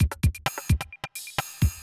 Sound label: electro kit 130